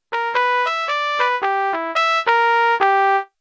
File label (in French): Trompette solo